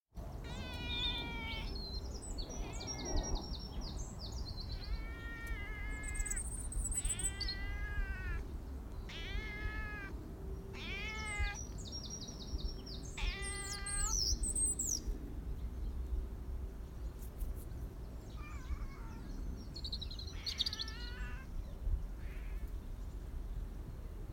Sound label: 2 pretty cat meow sounds